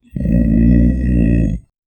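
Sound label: stomach growl hungry; organic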